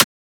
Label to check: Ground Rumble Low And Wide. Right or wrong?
Wrong